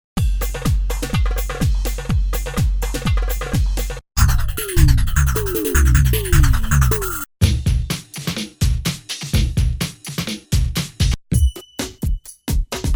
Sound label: Drums